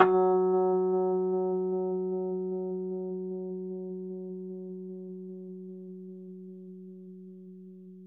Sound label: Rhodes F#; 3D Sound; 3D Keys VOL-1